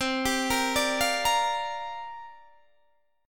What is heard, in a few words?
C 7th Suspended 2nd Suspended 4th, Guitar, Open D